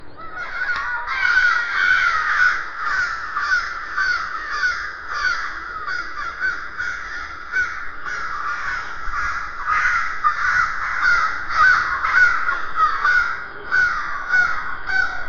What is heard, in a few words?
crows and seagull in the; slowed